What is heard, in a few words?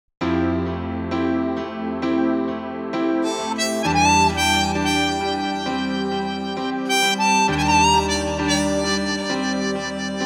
Harmonica Flute